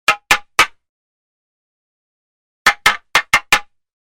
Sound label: Staff Hit Combo; Hollywood Edge; Martial Arts and Human Impacts